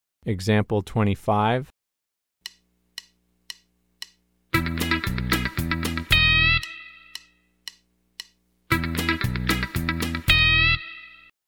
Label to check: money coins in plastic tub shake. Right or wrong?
Wrong